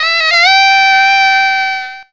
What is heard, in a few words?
FUNKY SAX; Zero-G; Funky Elements